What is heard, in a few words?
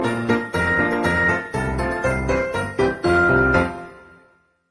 jingle short; Games by ANGELINA; A Christmas Present